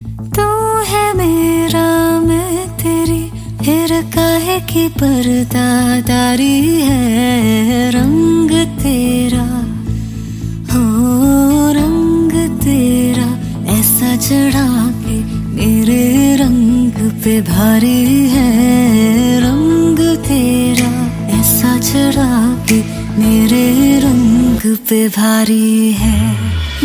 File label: love cute hindi song tone ringtone; bollywood